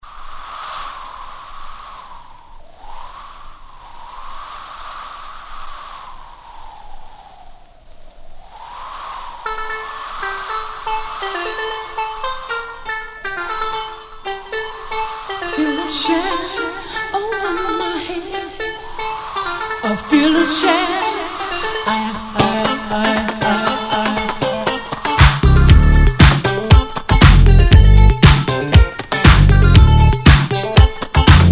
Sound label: DISCO Vocal